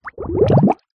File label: nature water bubbles rise surface small fast